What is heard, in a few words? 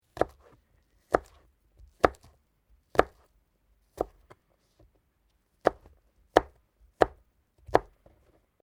Garlic Chop Sequence; Food preperation; Restaurants